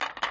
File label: rack, pool